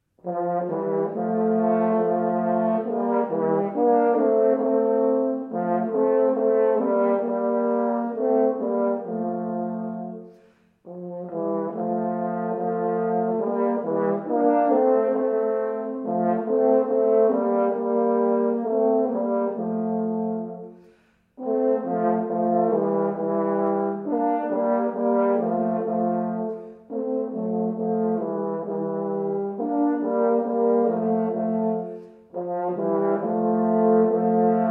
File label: Hornduo